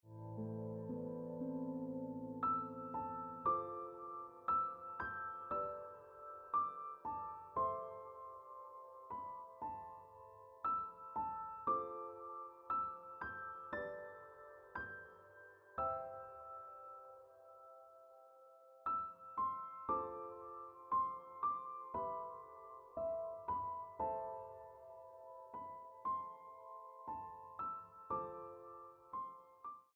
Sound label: Ringtone Piano Music